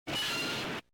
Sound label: attack; fujitsu